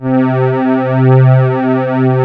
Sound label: P800 STR C1, Korg POLY800; Back In Time Records; Korg Universe VOL-1